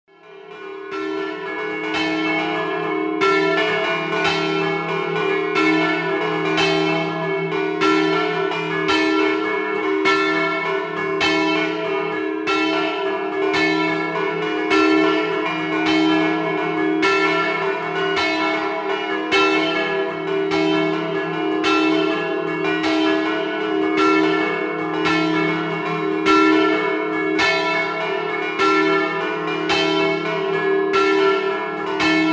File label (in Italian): scampanio liariis, campane